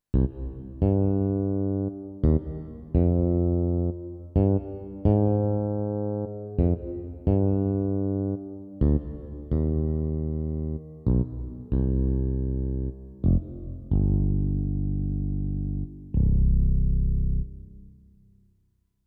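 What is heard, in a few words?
Finger Bass, digital piano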